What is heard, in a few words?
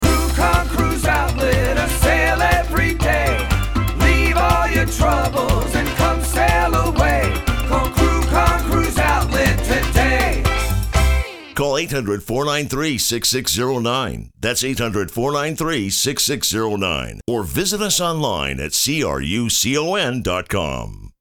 Jingle W Tag